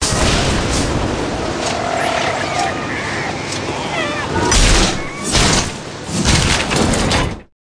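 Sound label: monorail little recycle plant tap; lisa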